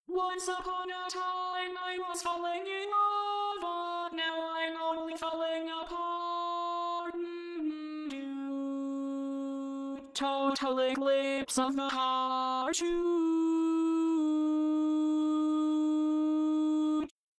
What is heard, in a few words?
barbershop tags